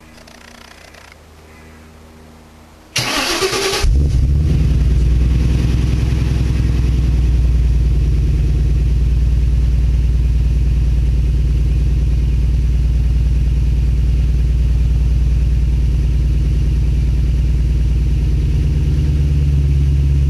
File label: new pipes, MGZT